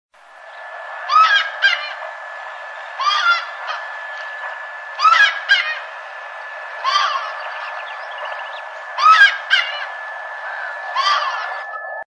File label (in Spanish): voz ipacaá